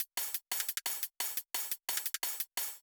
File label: 175 Bpm DnB Top Loops